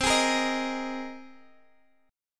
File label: C Minor 7th Sharp 5th C down Guitar Standard; strummed; capo 3